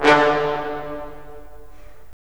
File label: BRS, Roland LA Composer